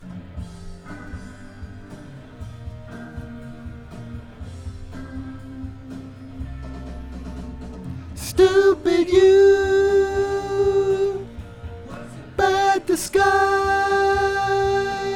VOX Back

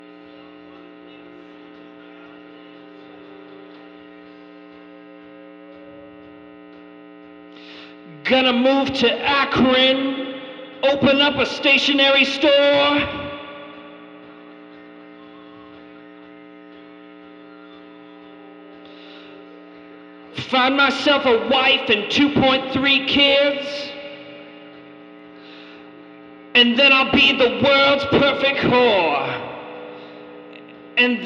VOX Vamp

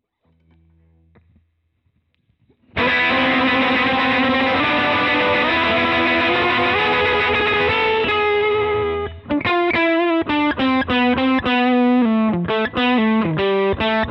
Gtr Solo

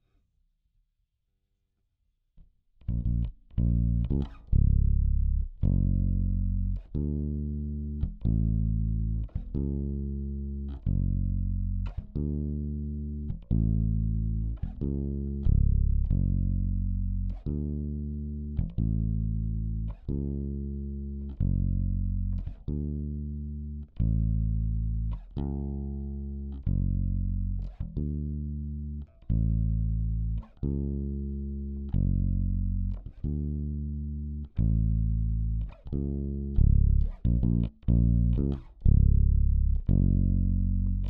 Bass DI

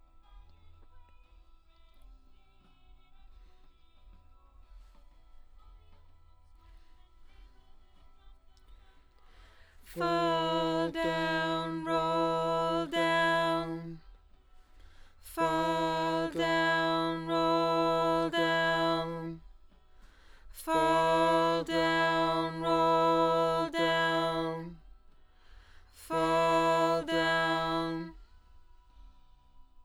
LdVOX Double

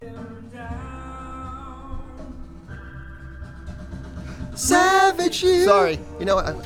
VOX Back

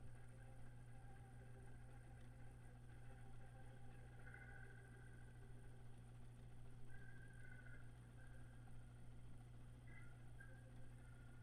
Leslie gtr

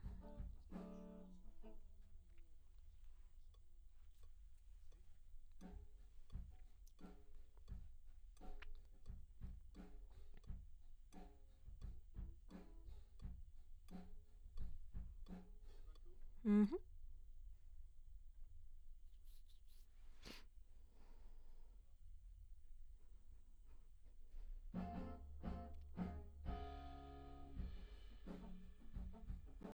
Ld VOX SM7

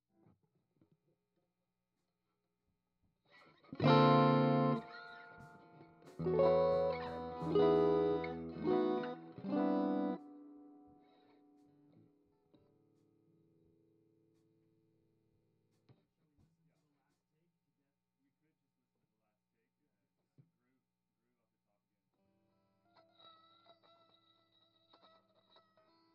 GTR

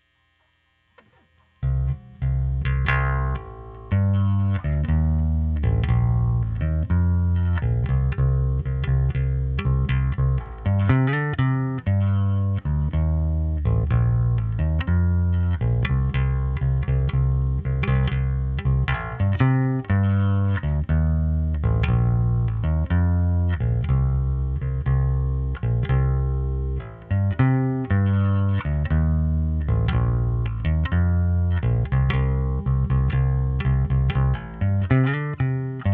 Bass OD

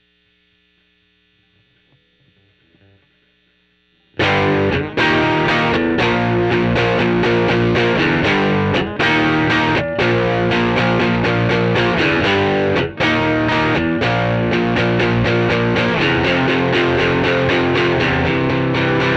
Gtr

